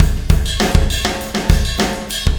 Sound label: Wormhole Beat, Processed Drumkits